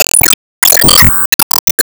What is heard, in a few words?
Enemy Jet Skill Shield Open; disney star smash android ios mobile gamerip 2020